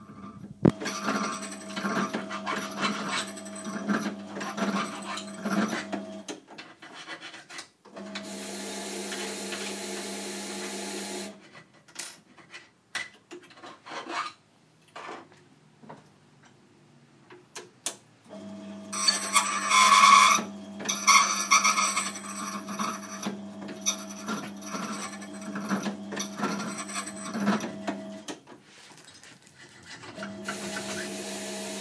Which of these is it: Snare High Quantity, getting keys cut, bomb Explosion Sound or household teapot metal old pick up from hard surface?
getting keys cut